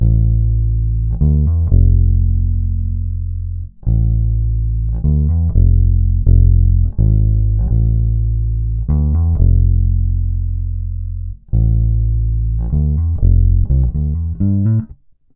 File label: Synth loop 125 Am, Synth Bass Loops; Slate Digital BASSHOLE Sample Pack